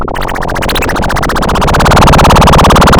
pop, Sound of a mud pump

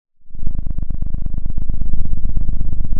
pop, Sound of an engine revving

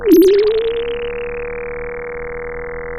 Sound of a bird tweeting nicely

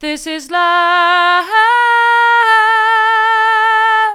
this is love mid, vocal

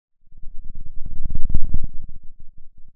Sound of a thunderstorm, center

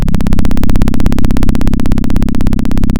Sound of a plane taking off

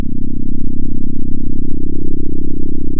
center, sound of a small motorcycle

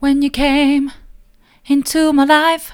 soft, vocal

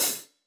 KHats HlfOp

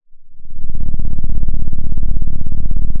Sound of an engine revving

